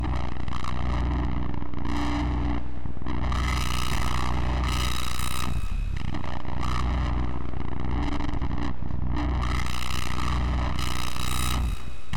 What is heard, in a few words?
Distortion